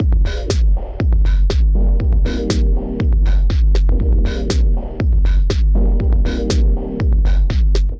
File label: Kit, Drums; Coping Kit; Ableton; Punch and Tilt